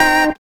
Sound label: organ, C keys